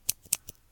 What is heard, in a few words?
automatic pencil